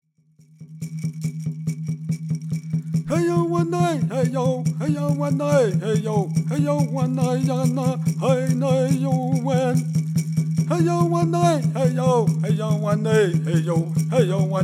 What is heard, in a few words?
Dineh Prayer Song